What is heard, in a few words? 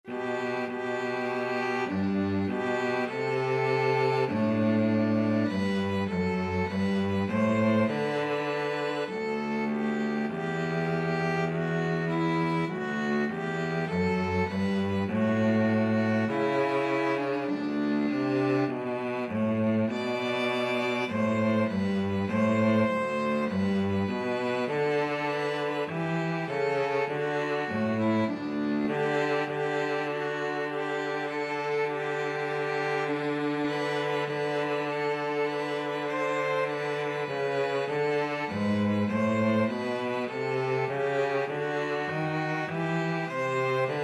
violin cello, violin cello duet